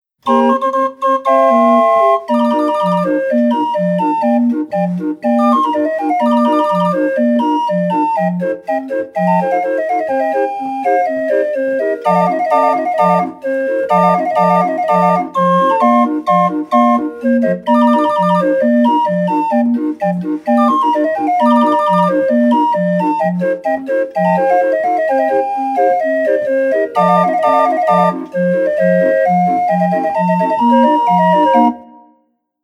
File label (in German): Orgel solo